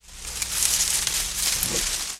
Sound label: Brush Crunch Sngl, trees